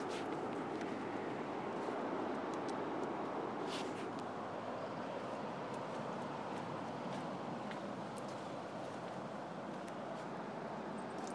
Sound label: seaside sounds